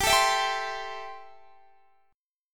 A-Minor 7th G

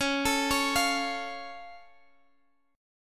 Db Augmented Db down Guitar Half Step Down

